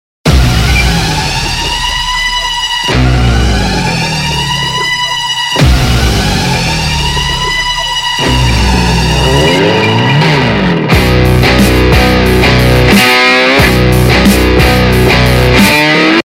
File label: Trumpet With Guitar Theme Ringtone; Bollywood; Kabali